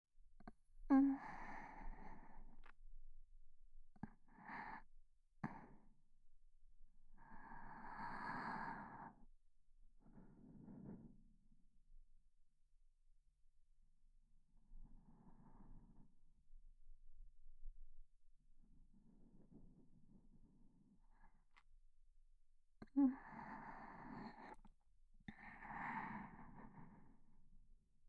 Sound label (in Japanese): 寝息; SEなし